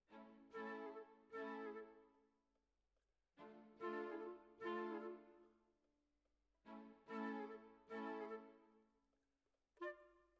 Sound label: Oboe